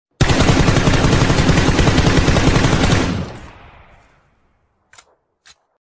m4 gun sound effect m416 gun sound effects; pubg

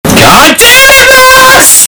earrape

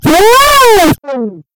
trompet

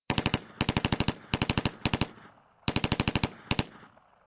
shooting gun

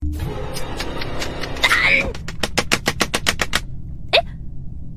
polka

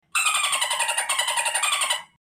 dolphin

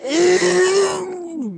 backwards throwup

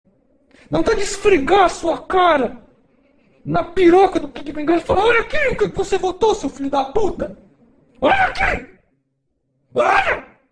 ringtone

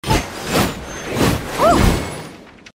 woooh; sucrose

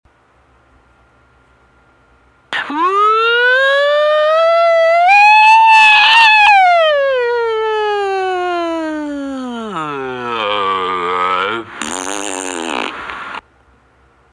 uuuuuuuuh

